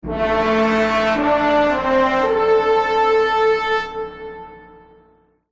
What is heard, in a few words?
Level Up Jingle; Avatar The Last Airbender The Burning Earth; PS2, Wii, Xbox 360